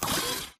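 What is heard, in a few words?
Tick Movement Servo Walk; Titanfall 2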